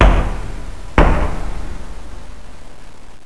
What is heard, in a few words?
walk